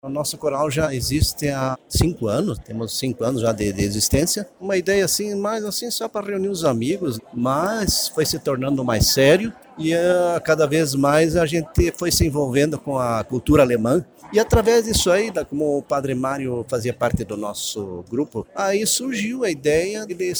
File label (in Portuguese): coral